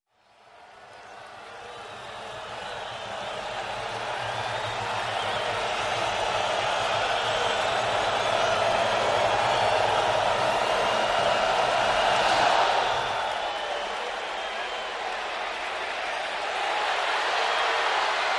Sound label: Football Crowd Chee, sports arena football